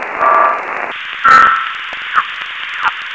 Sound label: 222MHz 6k, High Speed CW Meteor Scatter